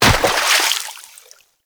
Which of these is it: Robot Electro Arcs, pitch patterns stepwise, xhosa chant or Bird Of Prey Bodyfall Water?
Bird Of Prey Bodyfall Water